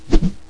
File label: cast